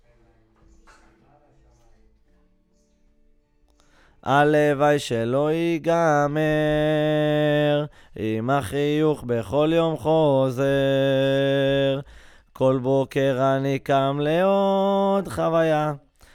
VOC GUIDE

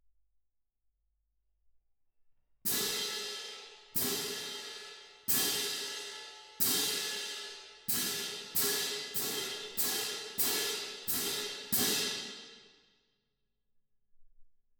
Twin R Perc

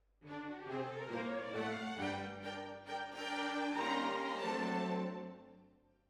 Hall

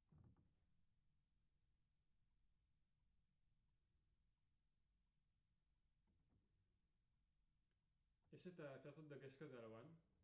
Piano A

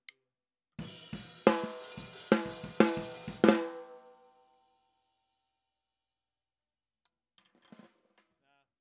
SNR

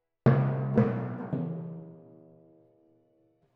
Twin F Timp

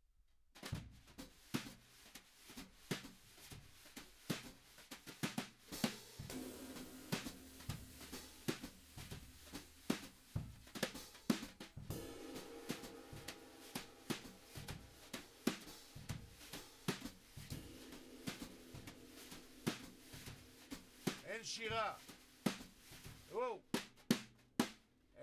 Drums

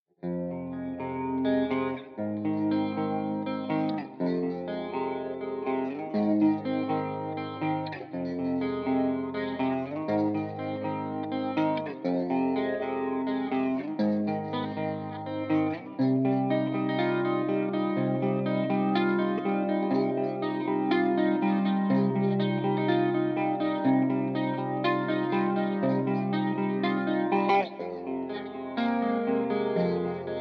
Guitar

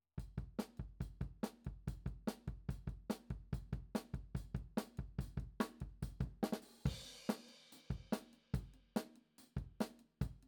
KIT

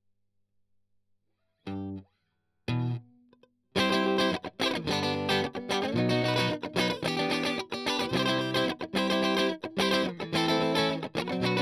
Strum

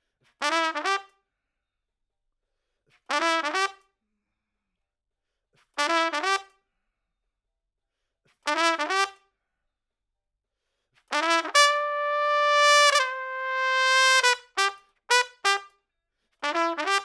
Trump

